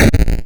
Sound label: fail; DelphiArcadeGames; AlienInvasionStarter